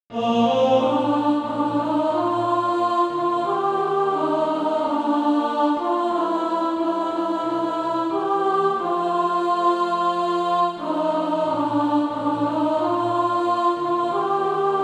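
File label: alt, Practise Music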